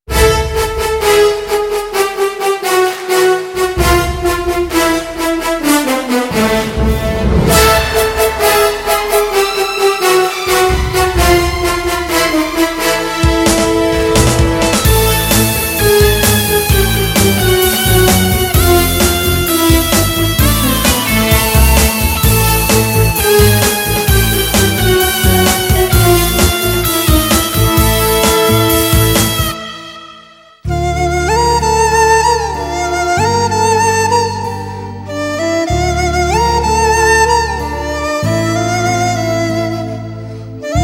Theme Music

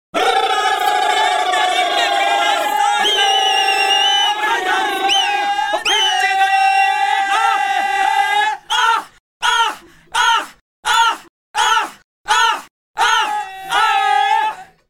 Outro Shouts

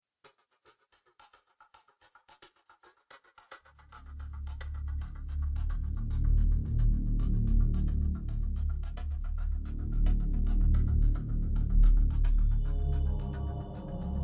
Background Score

